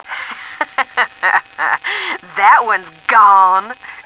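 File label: hehe, female; stars; taunts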